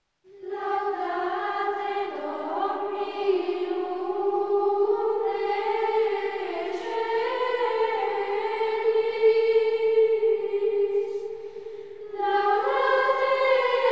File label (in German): Ambrosianischer Choral, Gregorian chant; Missarum; Ante Evangelium; Laudate dominum